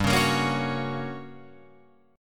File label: G Minor Major 7th Flat 5th, G, down, Guitar, Open F; strummed; JGuitar